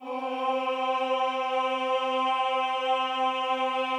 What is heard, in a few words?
Choir Dry